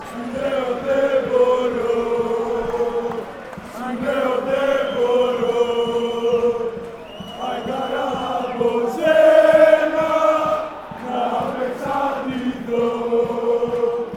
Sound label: aek athens chant